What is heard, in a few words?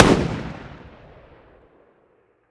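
weapon ar fire player atmo exterior; gmod; viper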